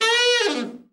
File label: ALT FALL, ALT-SAX FALL; Giga Samples Collection